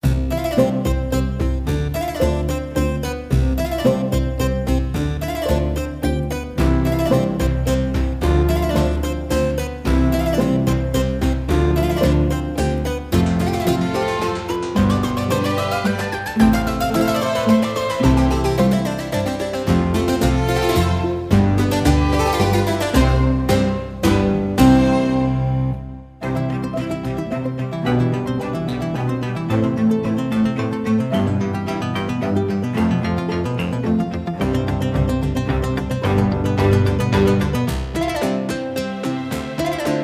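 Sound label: Village Kings Dining Hall music loop stereo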